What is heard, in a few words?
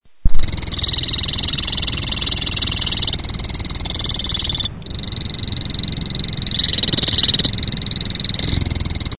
Epipedobates Anthonyi chor; Canto; Dendrobatidae